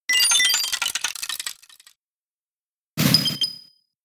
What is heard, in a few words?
Hail; Pokemon; Gen 5; Blk Wht Blk2 Wht2